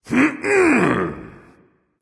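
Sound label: nonverbal clear throat; smite; voicepack; ullr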